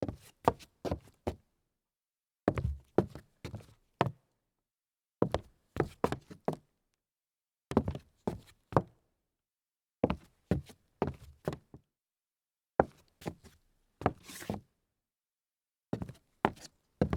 sidestep on hollow wood

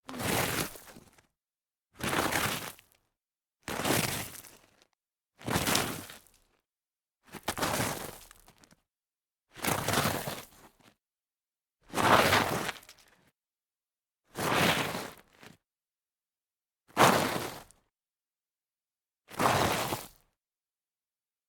slide on gravel series

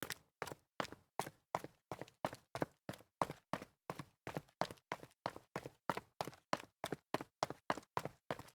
sneaker stairs jog concrete, foley

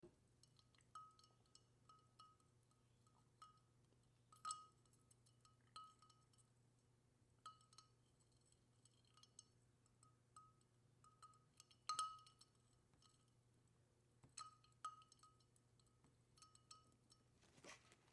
floating ice in a glass

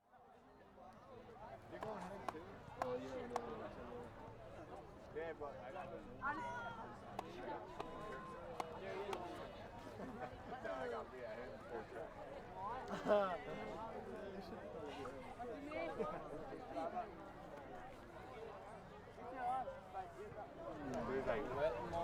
outdoor event background noise

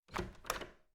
locked doorknob jiggle

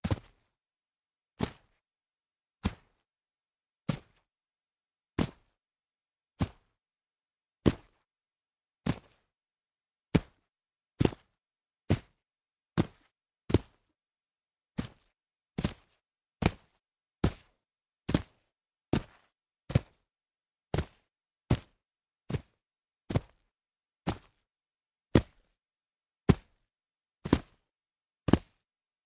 jump on soggy grass

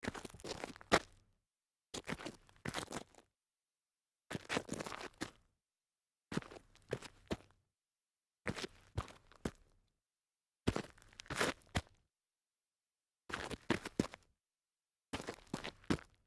walk turn gravel

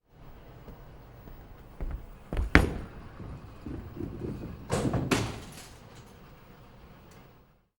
bowling gutter ball

